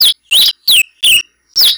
cardenilla

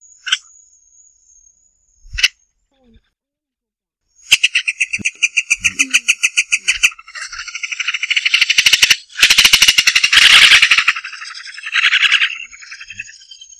martin pescador grande

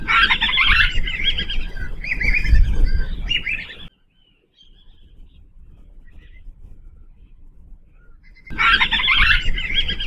pardela chica

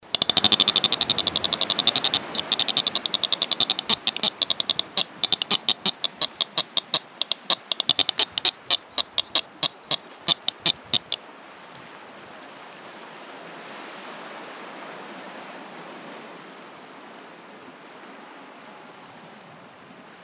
Albatros pico fino

